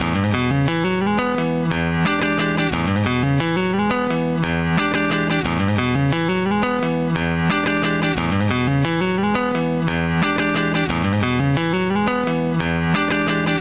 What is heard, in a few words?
dyna comp